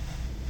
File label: slide